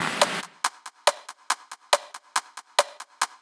Top Loop 140 BPM, psytrance; Top Loops

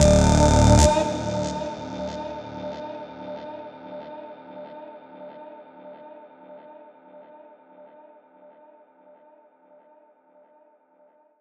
Voice Tweak D 140 BPM, Synths

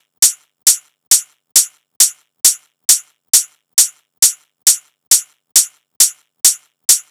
Hat Loop 135, Techno; Loops; Drums; HiHats; Ghosthack; AC2024 - Day 1 - Techno Sounds 2025

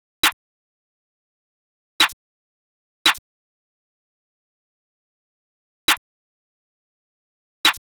Drum Loop - 170 BPM Counter Snare; Cymatics; Anthem Drum Loop

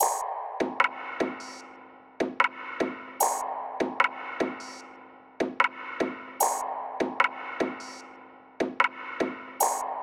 Black Card Perc Loop - 150 BPM; Cymatics; Fiji Hihat Loops & Percussion

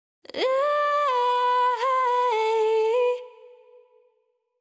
Euphoria Ad Lib Wet - 128 BPM E Min; Cymatics; Vocal Sample Pack; Ad Libs

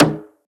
Synth Perc, Dubstep; Percussion; Drums; One Shots; Cymatics; Dubstep Starter Pack

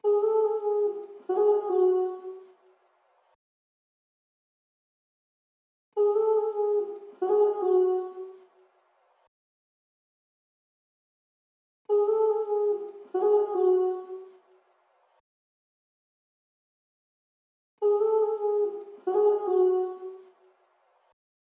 162 BPM C# Min Vocals; Melody; Melodies; Loop Stems; Cymatics; 2022 Melody Mystery Expansion; Feelings

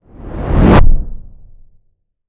Stress

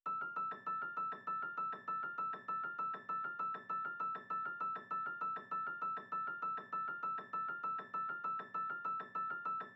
West Coast Melody Loop - 99 BPM A Min Piano; Melodies; Loop Stems; Cymatics; Oracle Sample Pack

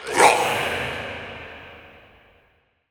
Processed Battle Shouts, Viking Vocal

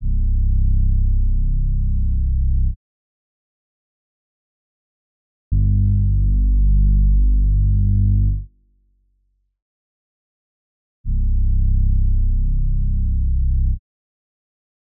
174 BPM G Min Bass; RnB; Loop Stems; Cymatics; 2022 Melody Collection; RnB Pt 2; No Way Home

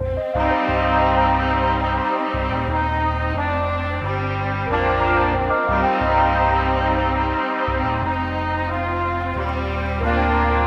Classic Melody Loop 90 BPM C# Min, Melodics; Cymatics - Eternity Sample Pack

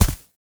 Cloth Armor Hit; Game Essentials

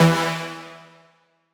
Riddim Synth Hit E Creeping; One Shots; Ghosthack; Riddim Essentials 2020

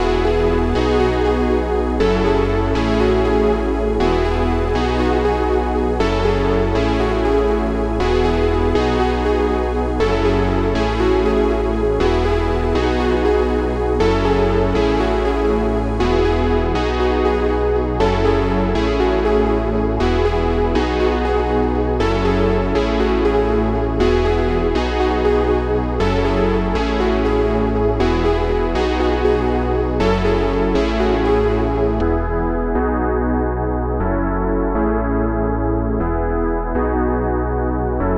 120 BPM C# Min, Melodies, 1980s; Arcade

